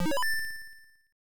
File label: COIN; Blades of Steel; arcade